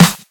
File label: loud snare one shot f key, snare drum sample tuned to the F note; snare drum samples